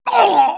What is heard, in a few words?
damage; mohaa; dialogue